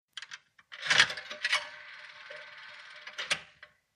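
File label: British Std Call Box 1 Shi, coins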